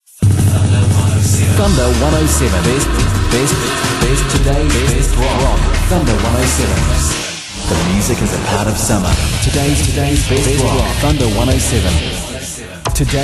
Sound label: thunder